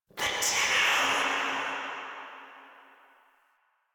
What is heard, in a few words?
Cave Whispers; Orokin; Warframe; Ambience; Stalker